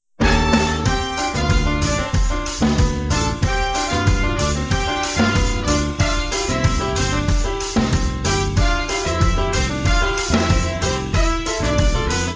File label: Jazz